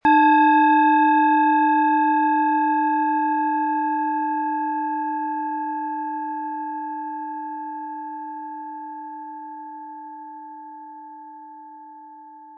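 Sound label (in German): Planetenschale Eros inkl. Klöppel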